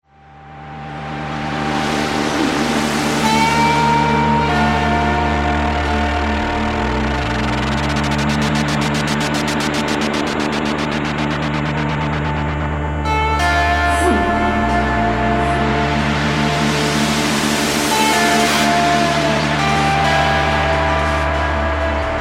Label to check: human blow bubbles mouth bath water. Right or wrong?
Wrong